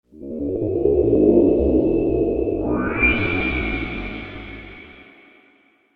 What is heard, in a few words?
Wormhole, Science Fiction; star wars / trek style games; Andrew Fleming